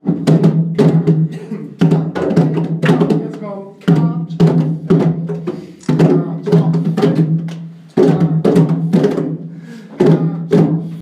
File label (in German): Riesensuppe trommeln